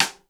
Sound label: HED P STIK; 90 sSampleCDs; Crypton - DrumBase5000